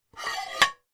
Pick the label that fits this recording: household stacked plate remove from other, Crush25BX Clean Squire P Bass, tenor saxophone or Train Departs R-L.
household stacked plate remove from other